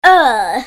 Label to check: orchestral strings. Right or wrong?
Wrong